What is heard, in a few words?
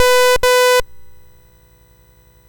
BASIC sid MOS6581R4